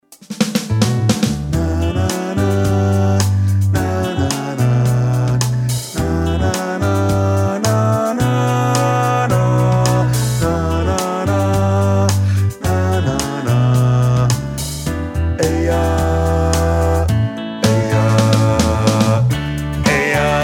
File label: Bass